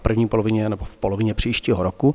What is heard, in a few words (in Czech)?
Mlaskani; zvuky na procvicovani strihu